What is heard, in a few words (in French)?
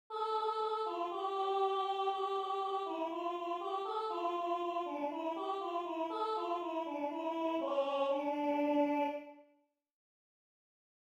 sopran; Übungsdatei 1. Stimme; Weihnachtskonzerts an der Schule Obstg